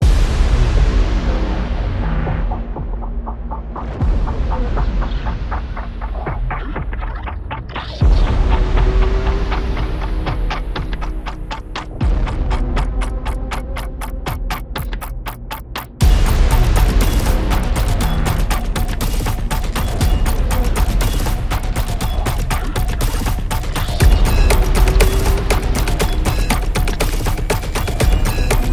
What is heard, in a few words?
Thriller; dramatic